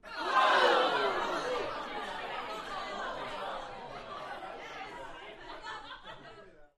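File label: Crowd 15 Interior Rowdy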